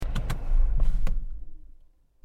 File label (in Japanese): 車のエンジンを切る音